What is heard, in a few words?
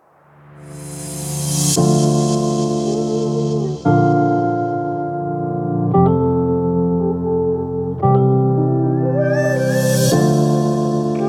in slow motion inspiring ambient lounge